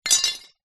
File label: animals horseshoes x2 metal down on hard ground